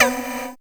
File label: percussion, Dance Synths; Mad Kit